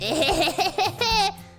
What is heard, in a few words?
ehehe